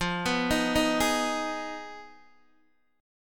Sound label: F Minor 7th Flat 5th, Guitar, DADGAD